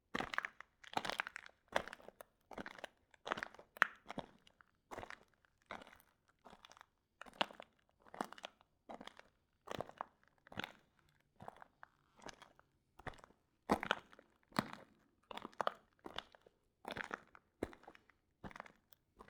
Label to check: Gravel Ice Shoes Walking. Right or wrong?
Right